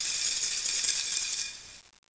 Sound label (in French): ouverture; Arc En Ciel Et Le Lagon Merveilleux; French Version